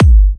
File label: G, Kicks; markus hakala